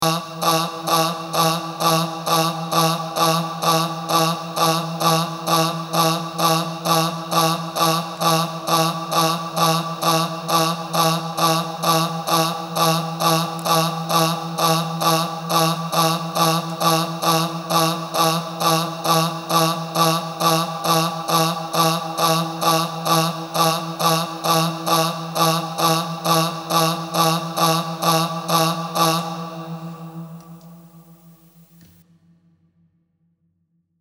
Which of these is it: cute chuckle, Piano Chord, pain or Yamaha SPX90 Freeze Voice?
Yamaha SPX90 Freeze Voice